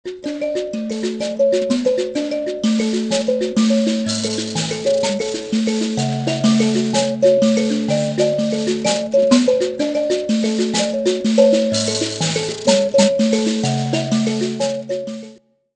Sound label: Mbira solo